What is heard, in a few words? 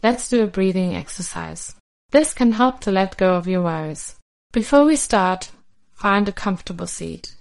Breathing Exercise; blowingbaloons